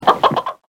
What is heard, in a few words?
chicken